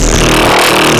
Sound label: manhack loop mid synth; npc; Half Life Alyx